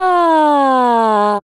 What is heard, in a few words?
female vocal sweep down